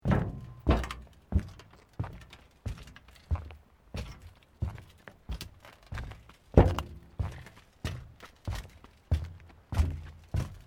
Footsteps Boots Plate Metal Sequence; Footstep Foley; Footsteps (Sound Effects:Human)

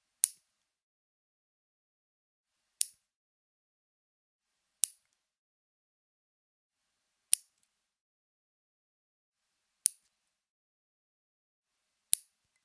Snipping nails with cutter; Clipping Nails; Miscellaneous Human (Sound Effects:Human)

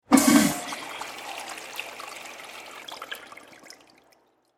Water Urinal Flush Public Bathroom Toilet; Public Bathrooms

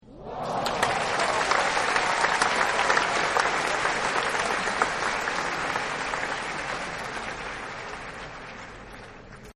Applause Woah; Applause Waoh Long; Human; Partners In Rhyme

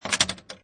Pinball Sound Ball Positioning Multiple Clanks; Video Game Sound Effects

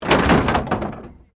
Wreck Door Panel Clunk Metal

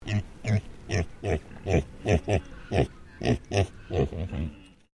Animal Pig Landrace Grunt Sequence; Peaches; Edgar's Mission Farm Victoria; Pigs; Animals

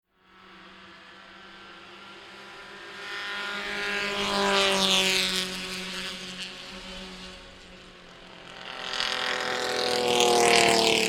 1960 Racecar 6 Cyl By; Three 1960 Race Cars By , 6 Cylinder

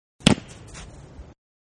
Football Catching ball using hands only; Football (Sound Effects:Sports); Manhattan Production Music

